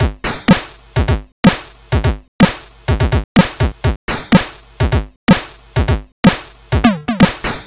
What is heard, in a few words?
C64 Break Loop, chiptune drums; Commodore 64